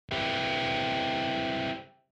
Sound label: guitar Dee Panpot